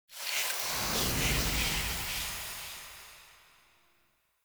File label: Ashen Spirit Foley Whoosh; Enemy